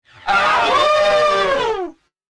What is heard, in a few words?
"AHUuuuuu"